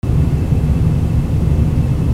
CARS

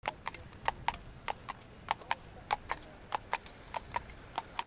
ANIMALS

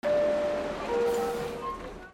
BELLS

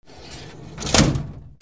OFFICE

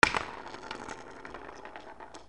FALLING

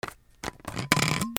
HOUSE

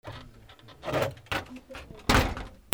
PHONES

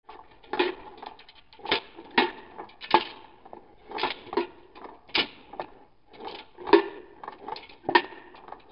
FRICTION

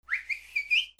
WHISTLES

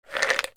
BATHROOM

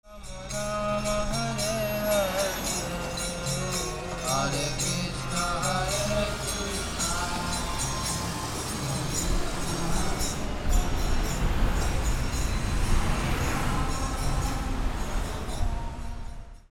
PEOPLE

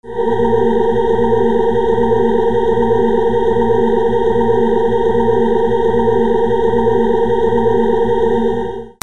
MUSICAL

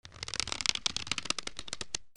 SQUEAKS

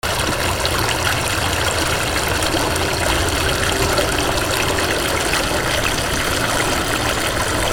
WATER

